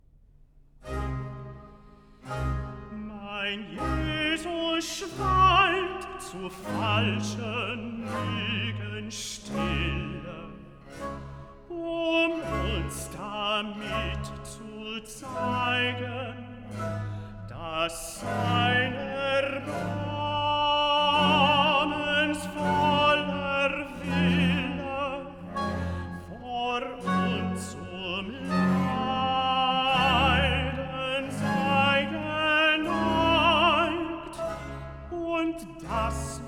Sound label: Recitativo; Bach Matthew Passion